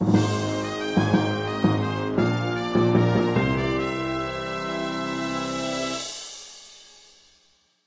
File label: Win; The Adventures of Tintin: The Secret of the Unicorn; Java, Android, Mobile